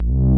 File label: backward bass synth